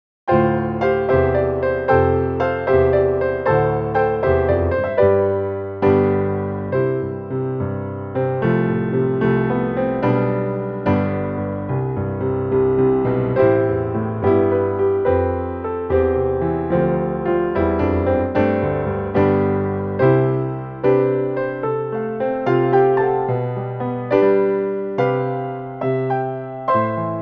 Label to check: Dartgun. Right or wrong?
Wrong